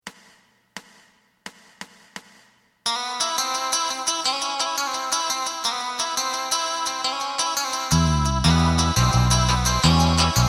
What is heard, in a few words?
drum